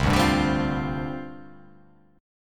C Suspended 2nd Suspended 4th, down; Csus2sus4 strummed; Csus2sus4 chord

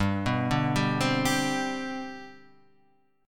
C Major 7th Suspended 2nd, G, chord

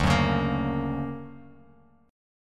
D Augmented Major 7th D down Bass Standard; D+M7 strummed; JGuitar

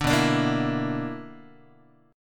B-Minor Major 7th Double Flat 5th, Guitar; BmM7bb5 strummed; JGuitar

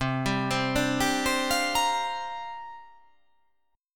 C 9th Suspended 4th C down

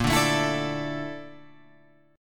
Bb Major 7th Flat 5th, Guitar, Open F; strummed

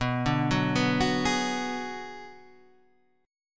Ab Minor 7th Flat 5th B

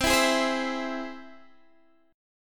C Augmented down Guitar Half Step Down

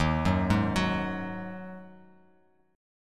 D sharp Minor Double Flat 5th, Bass Standard; arpeggiated

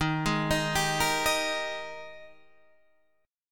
Ab Major 7th Suspended 2nd Eb; arpeggiated

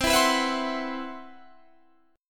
C Major 7th Flat 5th, Guitar DADGAD, chord

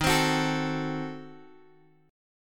D sharp Diminished 7th D sharp, Guitar, DADGAD; 13,15,16,14,x,x; chordshape

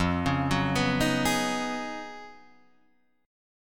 Db 11th F, guitar

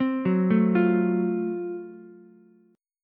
F sharp Minor Major 7th Flat 5th, Ukulele; arpeggiated

